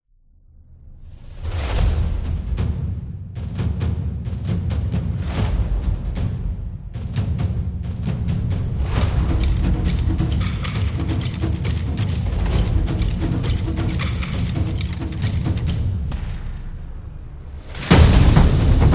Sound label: Ancient Japan; tribe